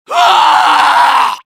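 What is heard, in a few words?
medic pain critical death; svencoop addon; tfc; player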